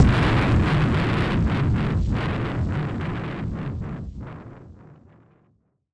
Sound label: Noise Bomb; musicradar; bomb factory